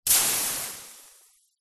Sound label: horror acid burn; zapsplat